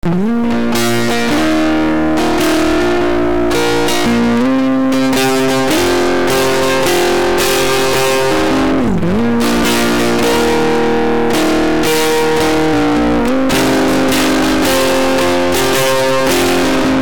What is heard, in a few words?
med distortion